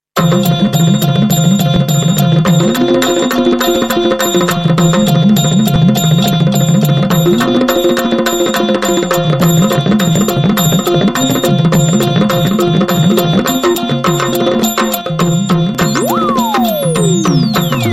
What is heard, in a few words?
Marathi Dholki